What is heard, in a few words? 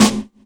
snare g sharp key; snare drum